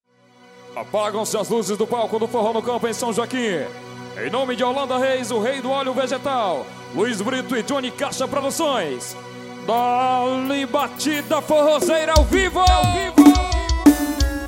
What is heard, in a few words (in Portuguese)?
batida forrozeira